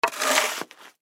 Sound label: Snow Shovel Scrape Cement Scoop Slush; Yard Tools; Weather; Yard Tool Sound Effects; Part of Yard Tools (Sound Effects:Tools); Blastwave